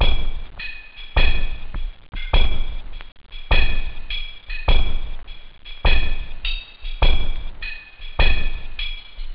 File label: clip clap, techno; OctaMED SoundStudio V1